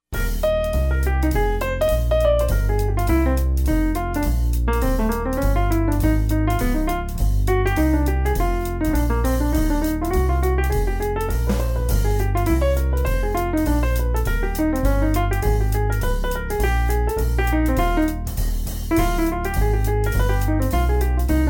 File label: Eb Major Etude